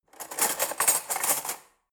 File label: cutlery forks drawer grab